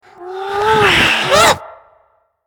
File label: Character Ghost Resurrection; Angry Birds Epic